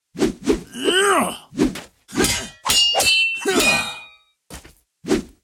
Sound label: Spear; SFX 3D Heroloin Spear Spattack